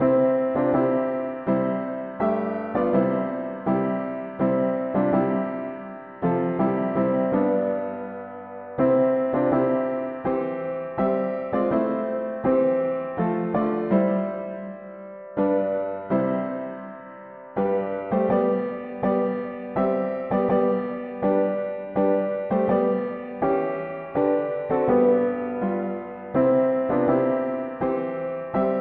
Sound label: mono piano; hymns